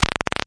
robot fart

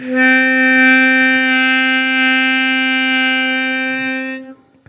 harmonica note

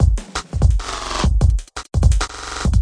drilly trippy, drum loops and breaks, 170 bpm

